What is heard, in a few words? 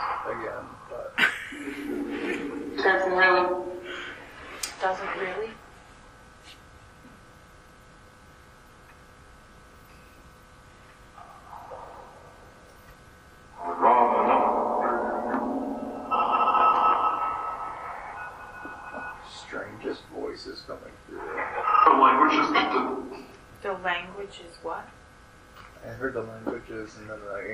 Phasma; Bedroom; private residence freedom road apartment investigation return